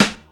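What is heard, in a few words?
snare drum; Snares; SD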